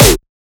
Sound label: snare oneshot; GhostHack